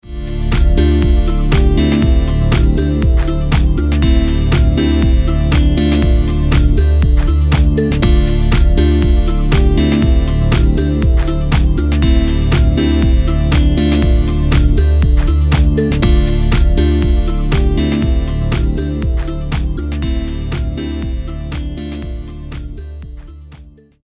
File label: Warm Synth Sounds with Presence WET; Being a multimode exciter, Presence analyses the incoming signal and dynamically adds new